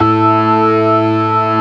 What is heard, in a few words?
STOPS, ORGAN; Keith Emerson Signature Series